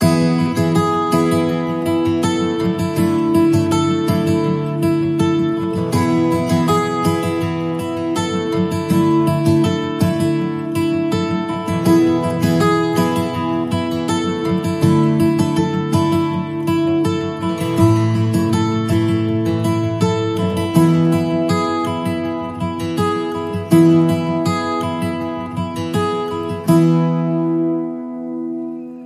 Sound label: Positive Forward, No Drums